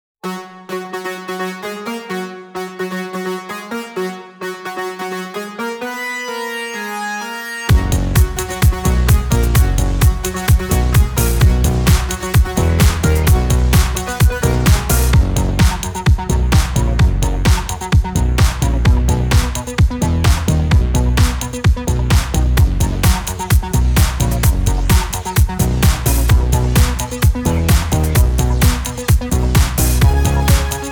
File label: Instrumental Version; from 'A Minecraft Movie'